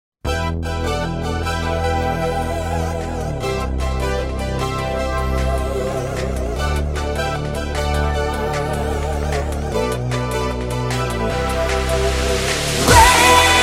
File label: Rain, eurobeat